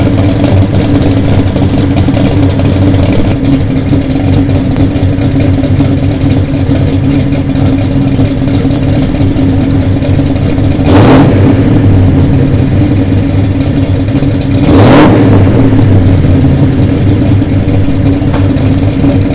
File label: idle rev